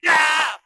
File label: scream 8 bit fighting guy; ooh ahh